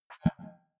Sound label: click big bubble, signale